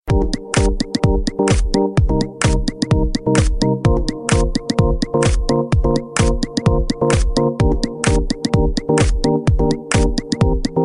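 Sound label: Nokia Lumia 800, Ringtone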